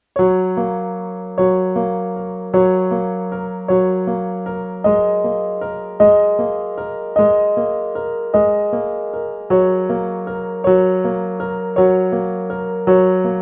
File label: solo piano